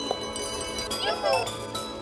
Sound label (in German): neue quest; botanicula